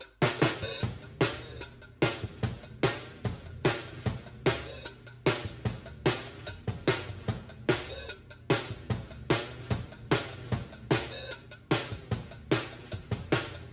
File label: Overheads